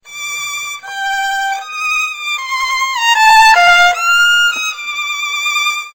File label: worlds smallest violin